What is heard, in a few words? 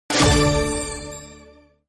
Eliminate Icon